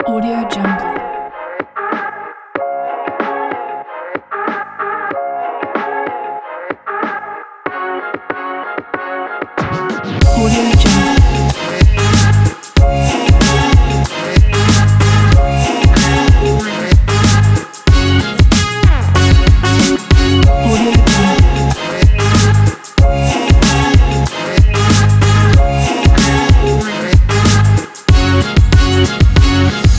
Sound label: positive hiphop